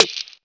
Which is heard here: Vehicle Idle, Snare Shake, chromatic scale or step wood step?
Snare Shake